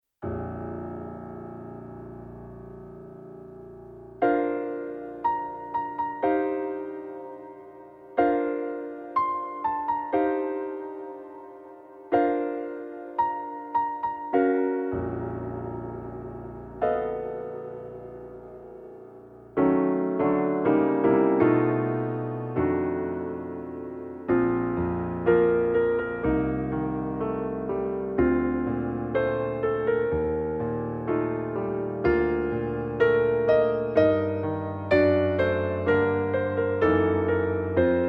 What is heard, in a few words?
weddi piano short